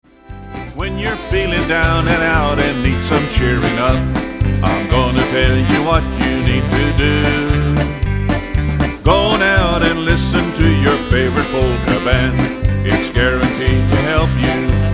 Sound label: polka